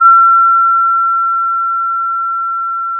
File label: Sound of a school bell